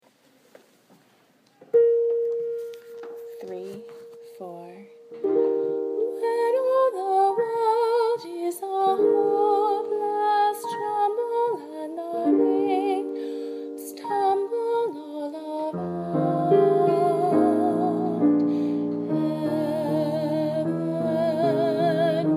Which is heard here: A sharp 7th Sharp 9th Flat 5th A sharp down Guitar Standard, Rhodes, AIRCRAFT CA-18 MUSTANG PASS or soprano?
soprano